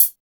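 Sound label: closed hi hat, jungle kit; Drums In Your FACE!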